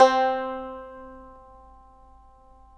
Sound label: tar; Roland - LA Composer vol. 3